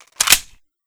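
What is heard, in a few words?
magazine in, colt; weapons; 1911; svencoop; hunger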